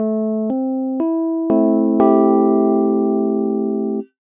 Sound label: A Minor Just Intonation